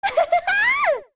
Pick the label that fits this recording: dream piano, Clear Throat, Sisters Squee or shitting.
Sisters Squee